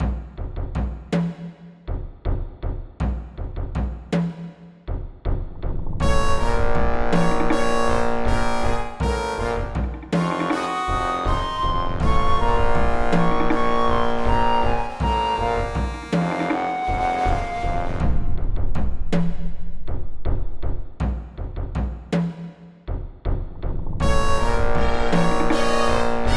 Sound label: Jungle; Monkey Brains